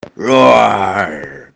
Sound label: roar arab